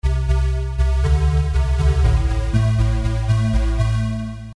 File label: Synthesizer parameters ADSR A long R long; Different amplifier ADSR settings